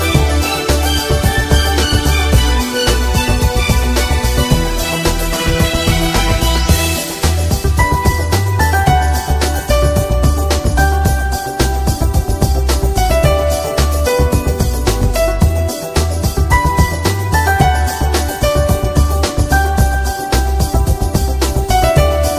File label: Music Instrumental